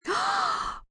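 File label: Gasp Breathy female, Gasp, female, breathy; Gasping Sound Effects; human voice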